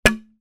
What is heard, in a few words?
impacts plastic thin cover